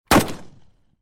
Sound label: AK47 shoot; Zomboid; VFE